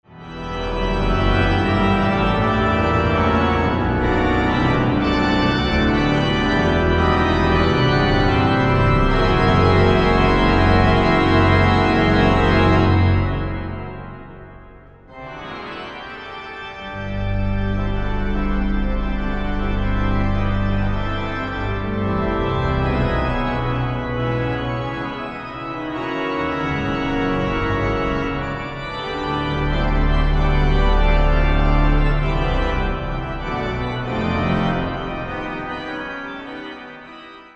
carillon da festa

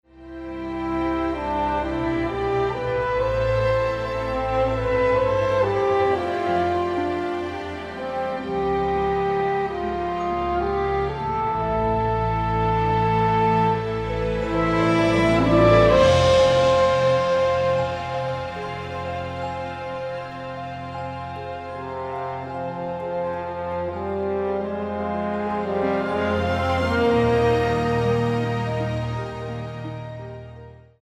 horn

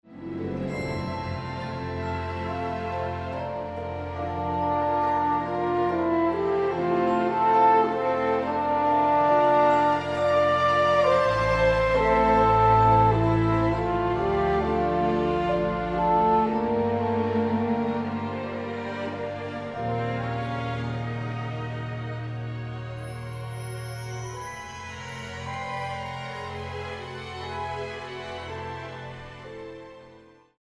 little horn in the sun

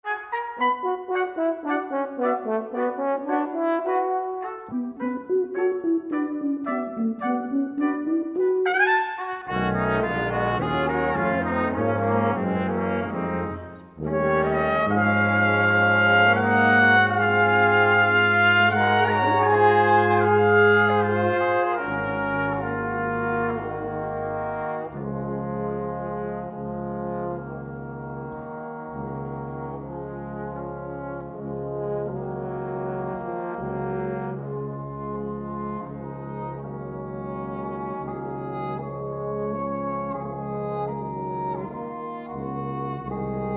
a windy christmas brass

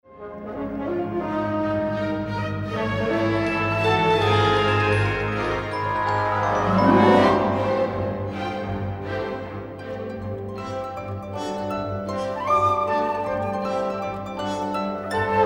celeste concertino

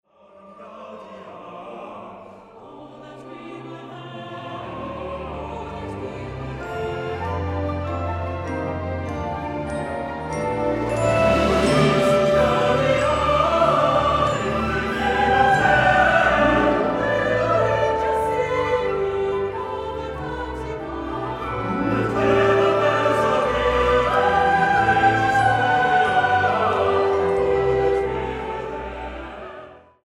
in dulci jubilo

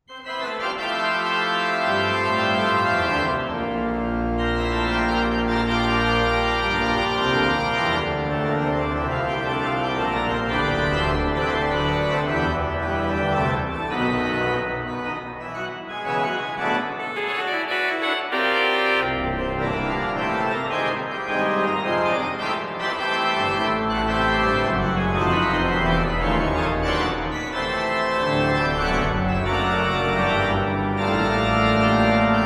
a christmas carillon

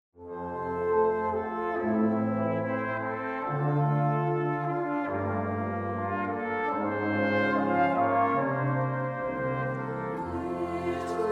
rocking

